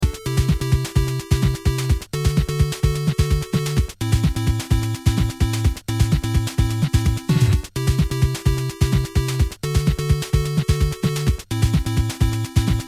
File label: beat